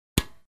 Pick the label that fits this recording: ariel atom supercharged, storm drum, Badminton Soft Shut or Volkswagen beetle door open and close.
Badminton Soft Shut